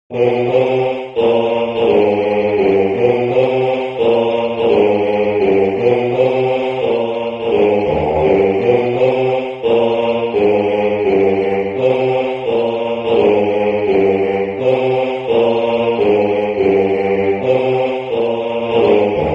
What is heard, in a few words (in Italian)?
tutti